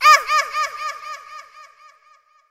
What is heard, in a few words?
lose; freak fortress 2; hat kid